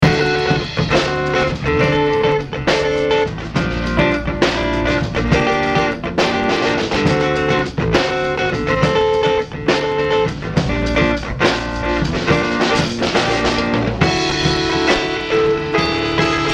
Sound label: guitar; Peter on guitar and drums